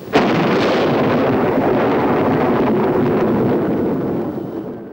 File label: Thunder